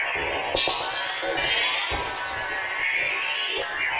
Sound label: Tonal Re Draw, sparse soundscape